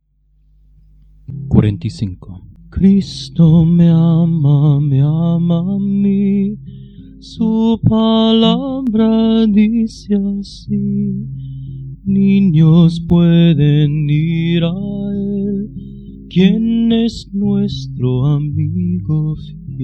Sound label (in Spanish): Coro, himnario niños